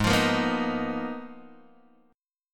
G sharp Minor 6th Add 9th, Guitar Open G

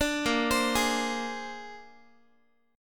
Bb 9th, Guitar; 17,18,15,18,x,x; 4A,4E,5A,5D,5G#,6C#; arpeggiated

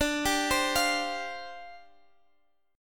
C Suspended 2nd Suspended 4th, D

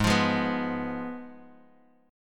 Ab Minor 6th, down, Guitar, Open F; strummed

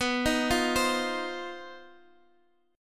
C Minor Major 7th Double Flat 5th, B, chord

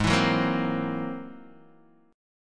Ab Augmented 9th Ab Guitar DADGAD

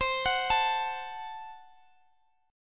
A-Minor 6th C, chord

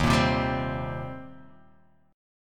D sharp 6th, D sharp, down

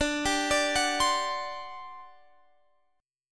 G Major 7th Suspended 4th, D

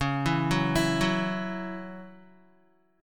Db Minor Double Flat 5th Db Guitar; arpeggiated; Dbmbb5; JGuitar

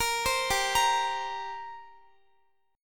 G Minor Double Flat 5th, G, Guitar; Gmbb5; arpeggiated

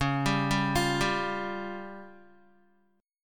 Db Major Flat 5th Db down Guitar; 4F,4A,5C,5G,5C,6E; arpeggiated; chord shape

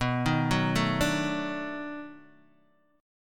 B 7th Sharp 9th B down Guitar Open D

